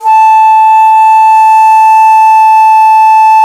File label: DIZI, Winds